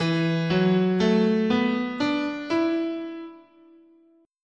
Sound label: E Suspended Pentatonic, guitar, scale